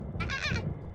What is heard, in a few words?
Scream from a little far away; IRyScream